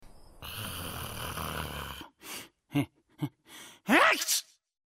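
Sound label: sneeze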